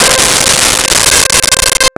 GM Piano C5; Nintendo; July 2020 Gigaleak

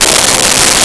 Tuba C3; Nintendo; July 2020 Gigaleak

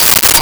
Agogo; Nintendo; July 2020 Gigaleak

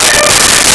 Airhorn; Nintendo; July 2020 Gigaleak

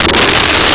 Gong; Nintendo; July 2020 Gigaleak; DrMarioSound

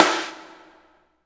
Drums; Nintendo; July 2020 Gigaleak

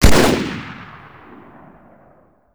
battle rifle, fire; weapons

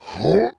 huh, elite, involuntary, pain falling; covy involuntary

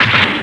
slot push; Nintendo

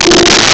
Synth Voice F4; Nintendo; July 2020 Gigaleak

